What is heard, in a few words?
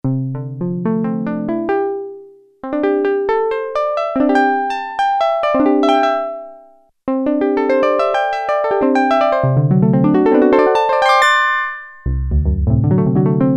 Plucked Harp, Prophet-5; Sequential Circuits Prophet-5 (Ver.3); Factory Patch; File 3 Programs